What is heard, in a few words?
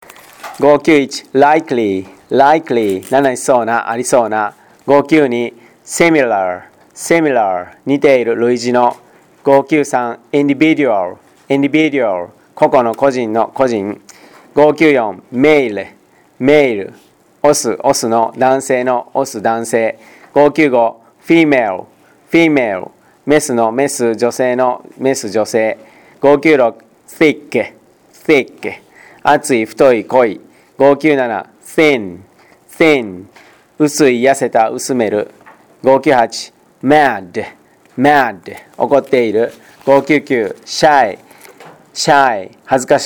tango